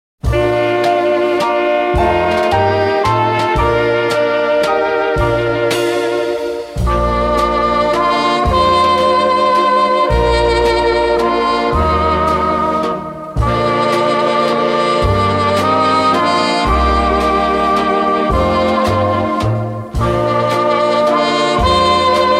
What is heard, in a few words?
instrumental